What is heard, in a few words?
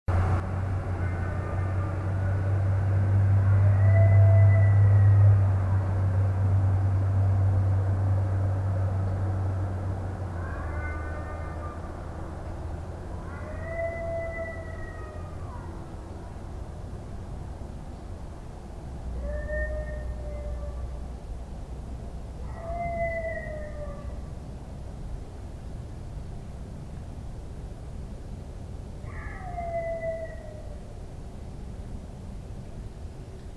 Howl filtered